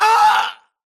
grunt death crush; Titanfall 2

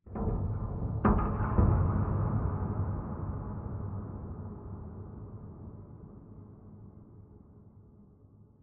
Metal Stress

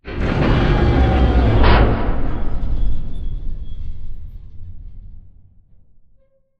Robot Arm Tilt Down Close; Boomtown; Titanfall 2

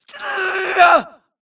grunt electro; Titanfall 2

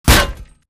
bullet heavyballistic titan; Titanfall 2

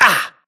grunt hits; Titanfall 2

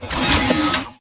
Stalker Mvmt Servo Damaged Light Medium

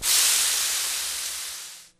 imp bullet energy default wash; Titanfall 2

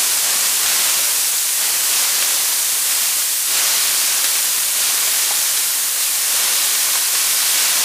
steam small; boneyard; Titanfall 2

